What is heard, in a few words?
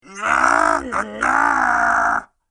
Rude Monster; Monster Sound Effects